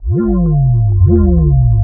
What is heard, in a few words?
Nu Breakz, Underground UK House & Electro, Synths; Sonic Foundry (Sony Creative Software)